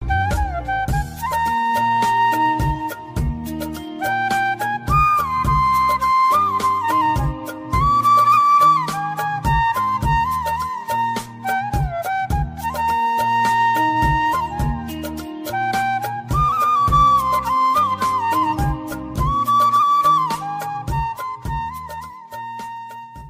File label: Flute Instrumental Ringtone